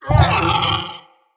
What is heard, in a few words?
Corrupted Pain, Animals; Bromius